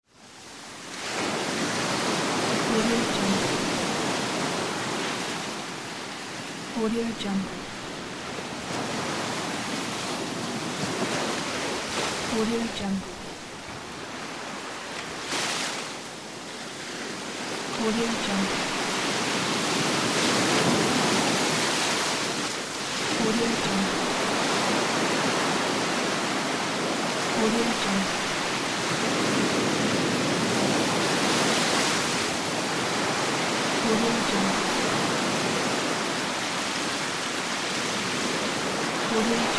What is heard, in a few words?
waves